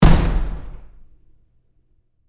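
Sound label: Door Bathroom Stall Close Close Up Very Hard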